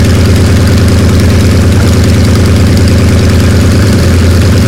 yak side, engines; War Thunder

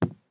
walk wood; Escape from Tarkov

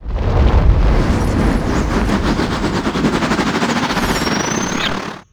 mohole discharge chargeup

